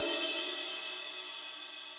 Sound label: Ride Mid Bright Room; Drums; Drum Hits; Standard Hits; Ableton; Session Drums Studio